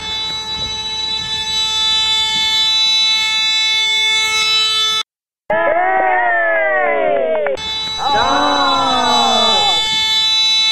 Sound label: Bus Broken Horn